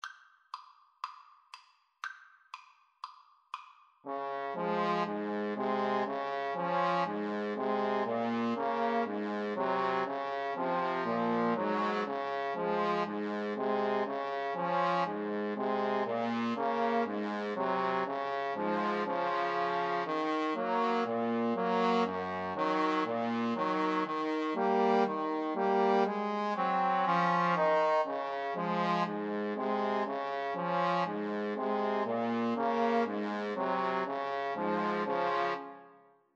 trombone trio

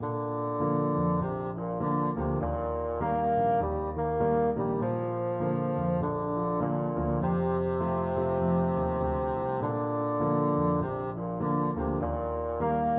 bassoon

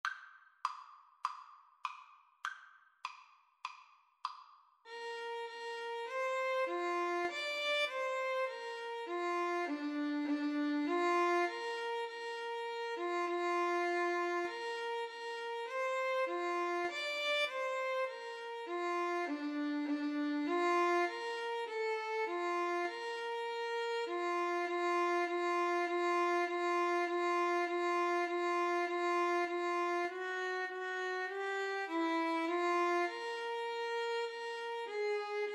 Clarinet Violin Duet

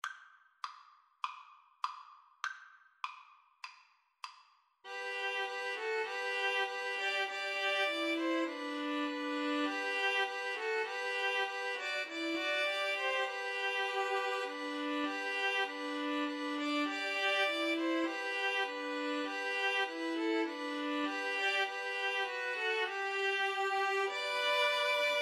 angels, String trio

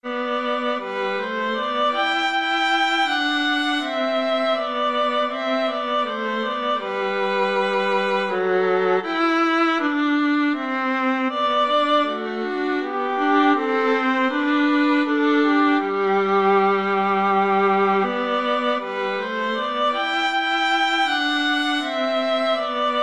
violin viola duet

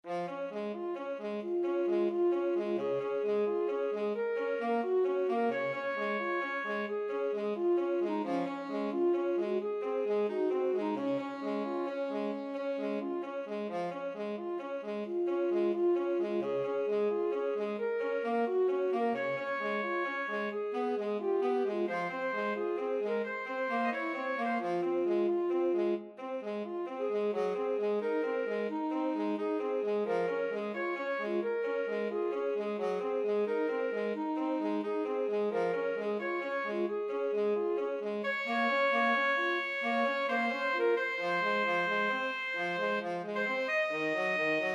tenor sax alto sax

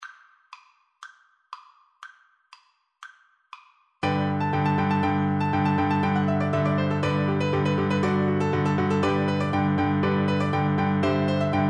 flute

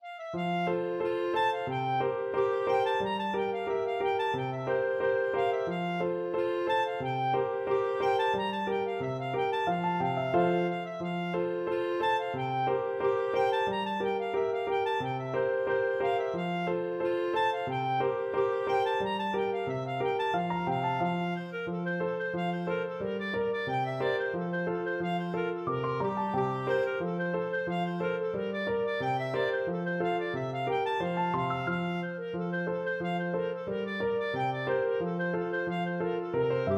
hornpipe, clarinet; bangup

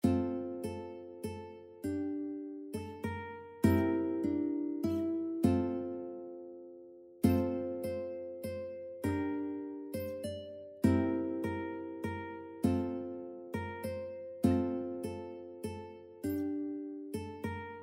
Uke

